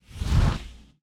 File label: pop right; dazzle